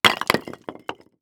Impact Concrete Drop On Concrete Multiple

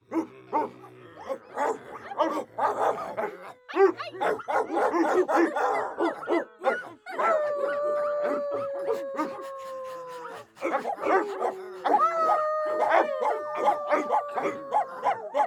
Cartoon Crowd Dogs Cartoon

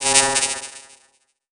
Multimedia Internet CD-Rom Flash Beep Electronic

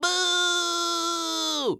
Human Male Boo Crowd Boo

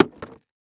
Impact Debris Large Plastic Hit By Copper Pipe

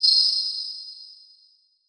Multimedia Internet CD-Rom Flash Electronic

